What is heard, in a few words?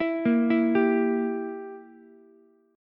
E Diminished E, Ukulele; Edim arpeggiated; JGuitar